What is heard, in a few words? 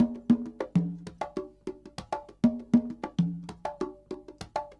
con, Percussion; Big Fish Audio - DOM